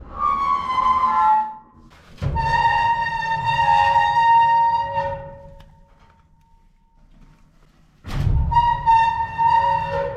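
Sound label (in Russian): тащит стиральную машину по линолеуму издавая металлический скрежет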